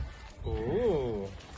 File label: ahjjhhhh